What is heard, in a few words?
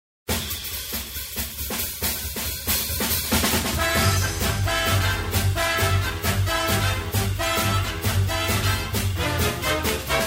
zing bigband